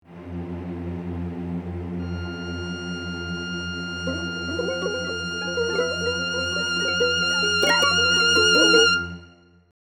Suspense violin strings eerie dark tense horror

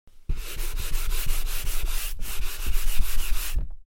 Chalk erasing on a chalkboard

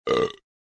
Short burp quick

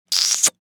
Sexy woman short kiss

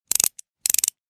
Ratchet wrench turning two times

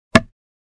Small sized thin wood panel hit

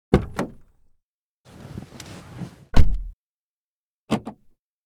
Car interior perspective door opening and closing

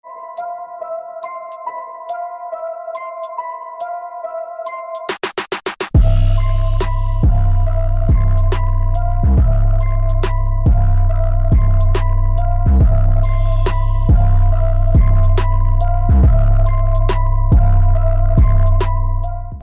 Deep bass impact bass cannon; bass boosted

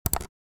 Mouse click

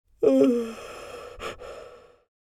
Elderly woman yawning; yawn sound effects

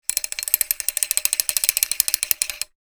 Chain pulling through mechanism

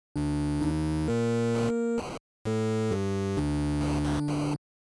Retro 16 bit or 8 bit game over tune

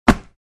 Catching a rugby ball

